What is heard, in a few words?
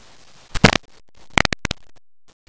fart